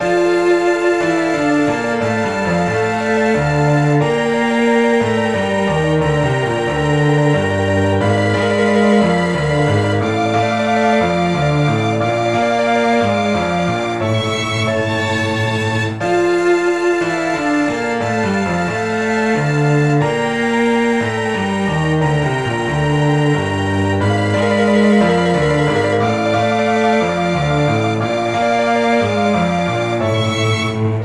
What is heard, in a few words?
Melodramatic Tragic; Violin, cello, piano; synth